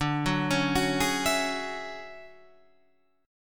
D Major Sharp 11th D Guitar Standard, chord; arpeggiated; JGuitar

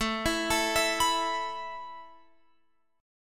A Suspended 2nd, down, Guitar, Open F; Asus2; arpeggiated; chordshape

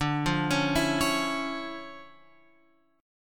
D Minor Major 9th D; DmM9 Chord {10 8 11 9 X 9} arpeggiated

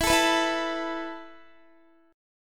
E Suspended 4th, Guitar; strummed; Esus4; x,19,19,16,16,16; 4E,4A,5D,5G,5C,6F

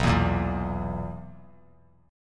B Major 7th Suspended 4th B; BM7sus4; strummed; 11,11,13,14

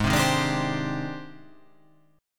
G sharp Major Sharp 11th, chord

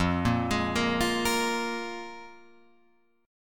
F Minor Double Flat 5th F down Guitar Open F; Fmbb5 arpeggiated; JGuitar

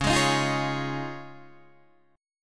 C sharp Major Add 9th, Guitar, Open D

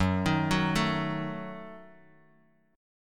F sharp Minor 6th F sharp Guitar Open D

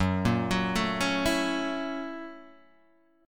F sharp 7th Sharp 9th, Guitar, Open G

